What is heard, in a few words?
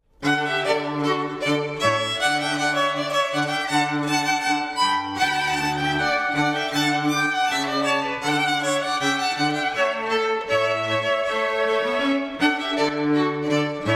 Rondeau TRIO